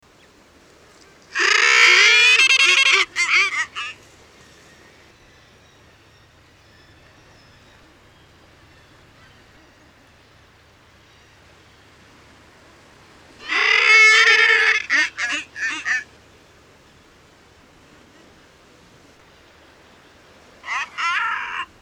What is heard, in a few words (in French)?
guillemot troil